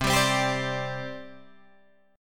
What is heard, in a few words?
C Suspended 2nd, C, down, Guitar, Drop D